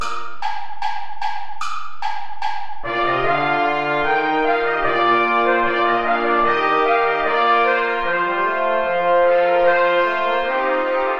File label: ballet play along without trombone; praetorius michael des baccanales